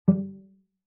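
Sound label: Cello pizz fortissimo sul C Gb2; MIS Pitches - 2014; Strings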